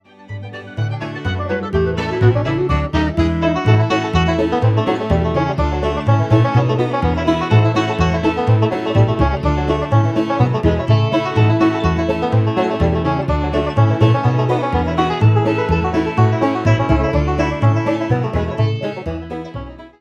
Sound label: Polka, banjo, bluegrass